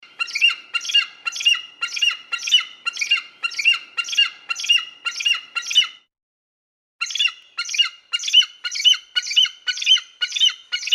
Mighty Eagle Screech Sound

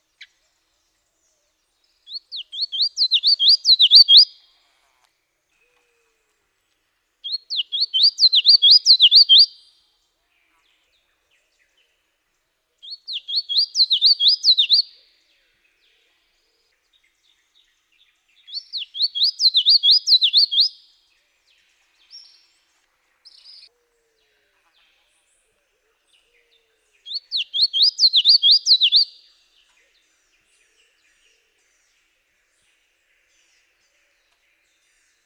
Yellowthroat Sound

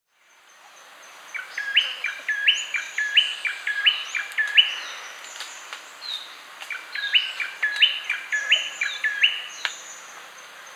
Tailorbird Sound

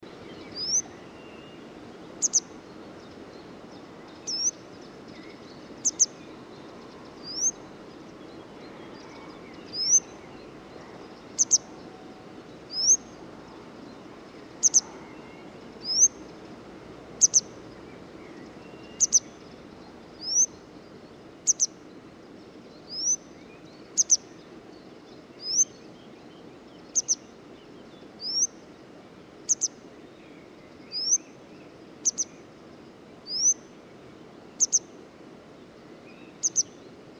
Grey Wagtail Sound

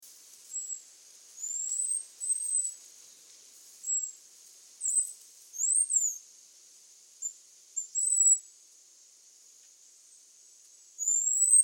Babbler Sound

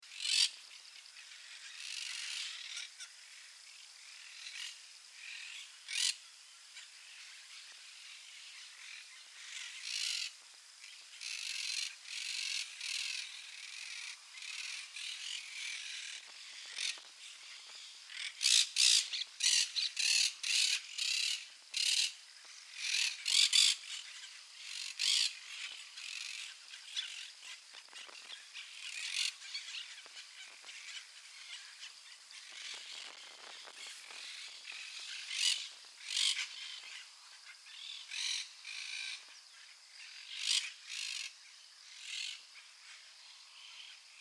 Quaker Parrot Monk Parakeet Sound

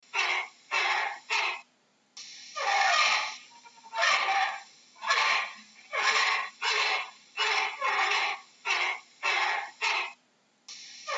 Orangutan Sound

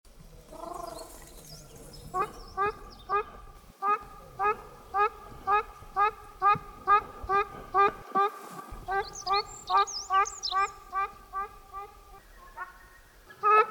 Shelduck Sound

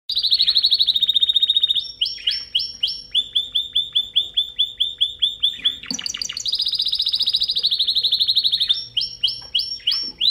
Canary

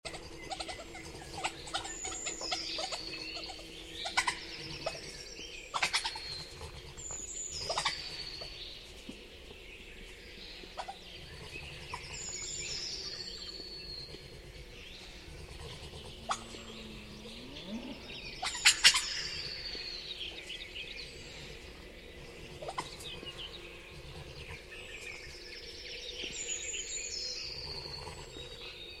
European Badger Sound

